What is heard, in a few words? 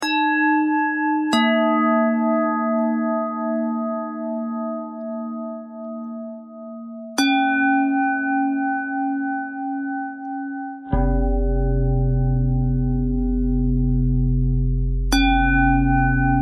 bell threads